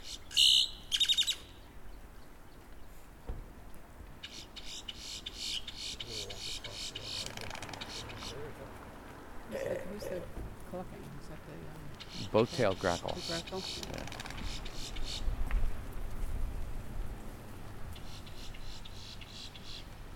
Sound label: Boat tailed Grackle Quiscalus major